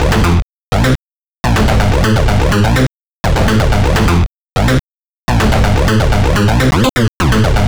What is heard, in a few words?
Bass Line; Eclectic Electronica